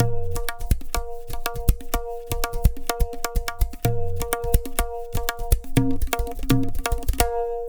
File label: tabla, 125 bpm; Zero-G; Pure Tabla